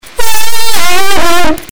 amen distort

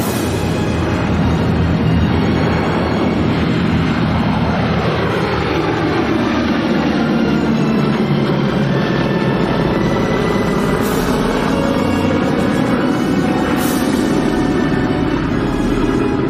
dramatic airplane

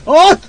ahhhh

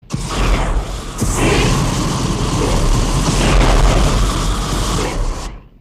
fire blast

